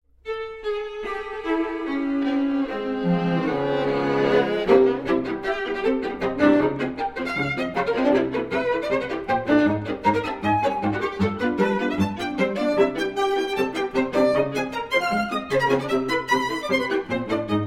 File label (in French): Polka